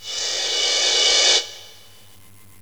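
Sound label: Reverse Cymbal; GMTones; Percussive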